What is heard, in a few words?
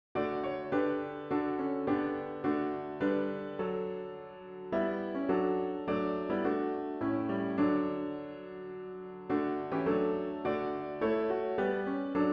While Shepherds Watched Their Flocks piano with intro; worship service